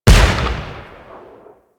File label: Cannon Battery Fire; Warframe; Railjack